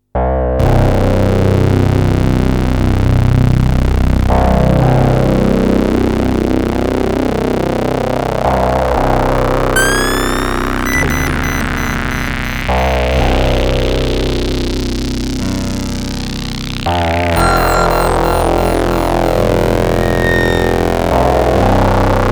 MicroBrute

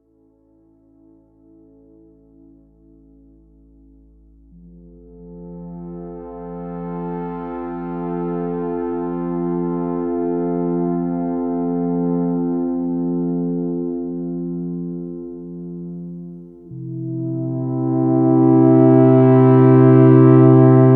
prophet5 strings

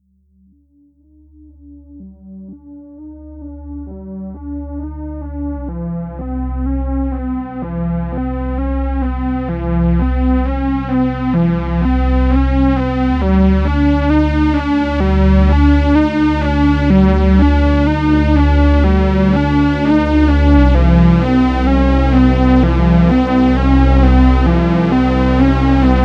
Dominion Simply Analog

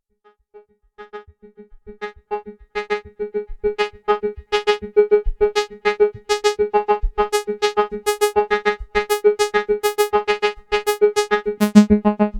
ms20 fx

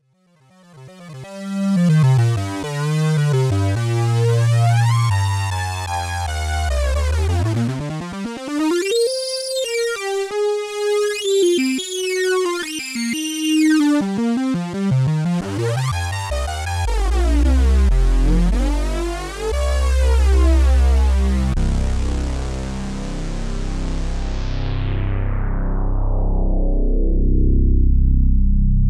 Hydrasynth Sync

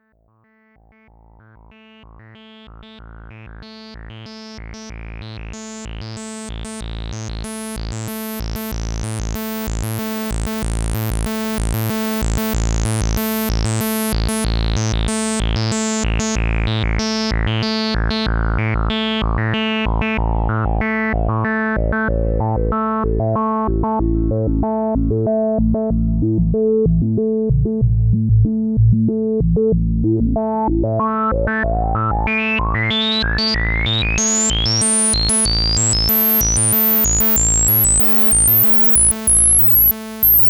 Simple Sequ

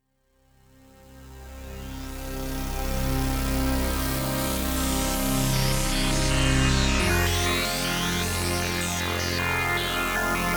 Roland Jupiter8 Massive Pad

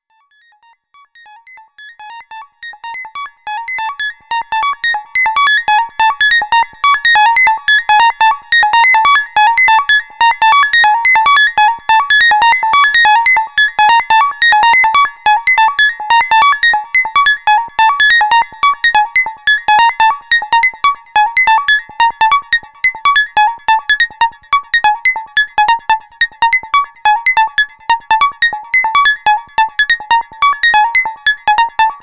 sonicsix OSC morph

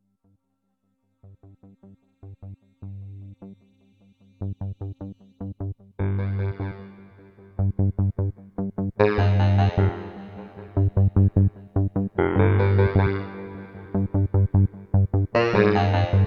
Ensoniq Fizmo Vocal Staccato

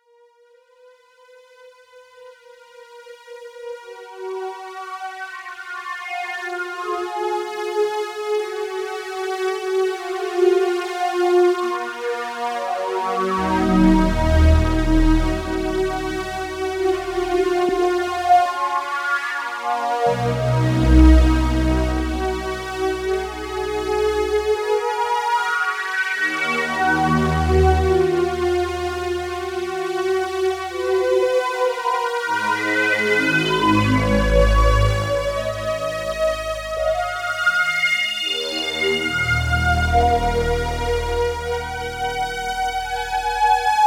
Synthex harmonies, synthesizer

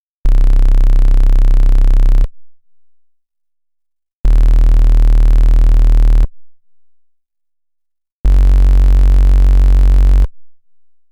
Tri Saw, synthesizer

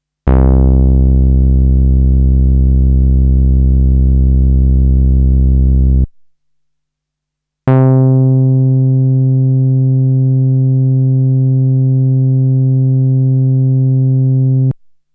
Bass Dark C

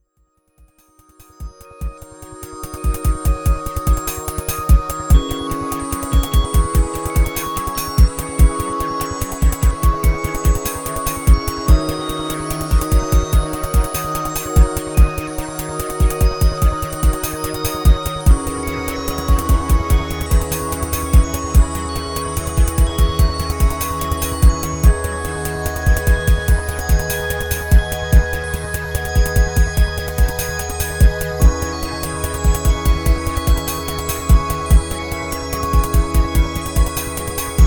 Waldorf Quantum Rhythmic

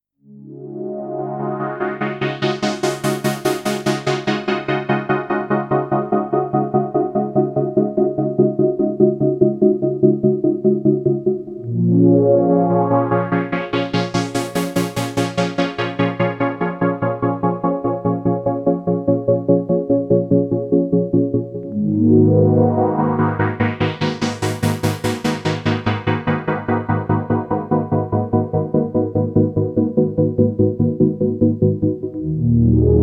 bit99 pad

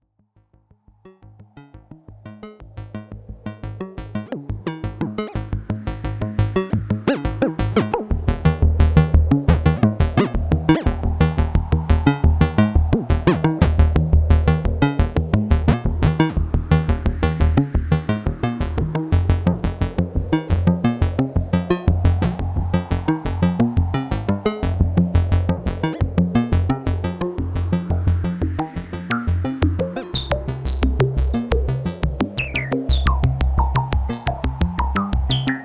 jupiter4 synthex

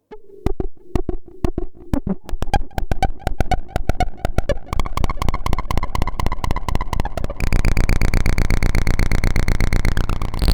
Novation Bass Station II Tonal Range

